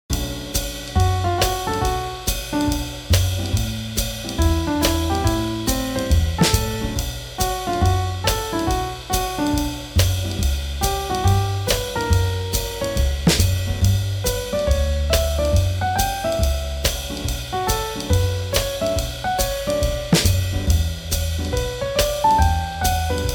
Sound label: Swing Jazz